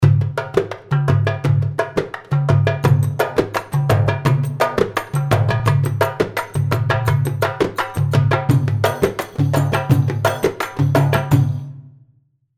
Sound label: rhumba khaligi 085, darbuka